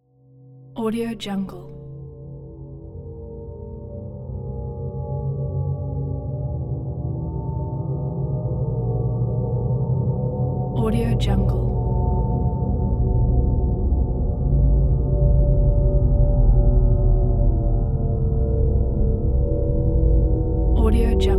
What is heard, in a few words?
trailer